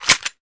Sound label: MK23 Blow Back; czero opforce